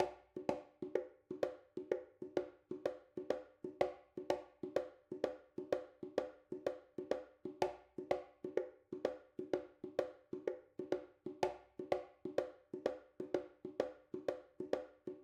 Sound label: Conga; unsighted; Gym Conga lvl1